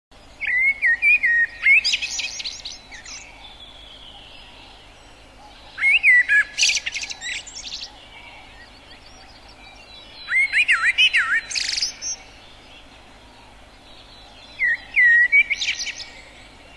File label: blackbird, birdsong; Blackbird (Lon Dubh); The blackbird is one of the most common birds found in Ireland. It lives here all year round. It is what we call a resident bird.